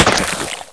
blast; hl1mp; XDM; squeek

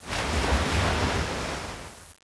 water ocean wave break; hl1mp; dm tropic

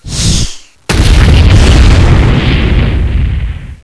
explode, weapons; XDM

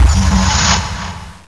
respawn; XDM

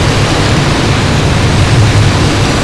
flame run, weapons; XDM

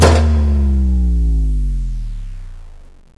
power down; XDM; ambience

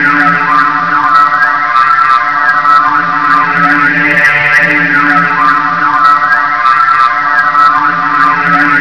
tunnel; valve; elite ltd

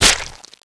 strike, xen tree; XDM

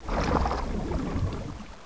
swim; XDM

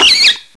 rat die; XDM

